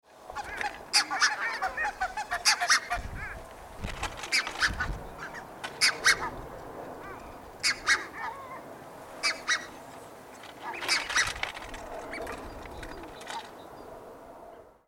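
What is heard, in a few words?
red-breasted goose voice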